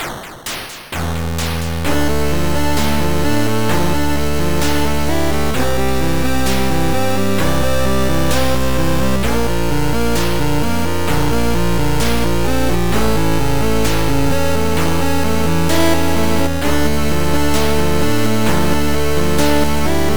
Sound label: Forgotten Stories Chiptune